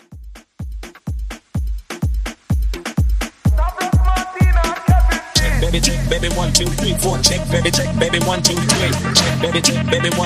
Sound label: Afro Vocal Edit